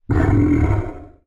roar

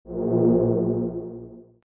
teleport

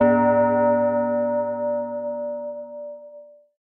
bell

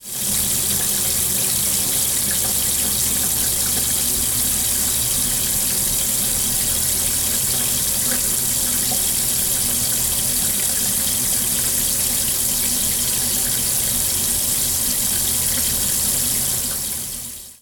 faucet

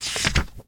page

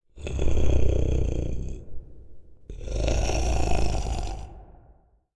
monster snore

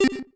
button

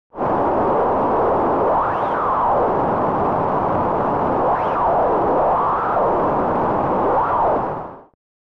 noise

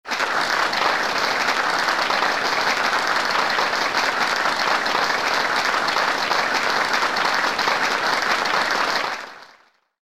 applause

